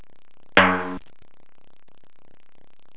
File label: DRUM